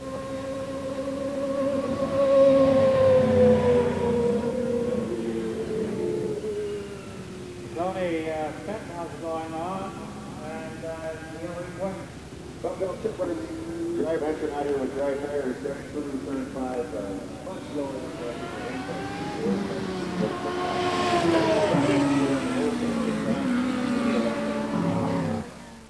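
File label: indy, racing; WAV Sound Effects; sports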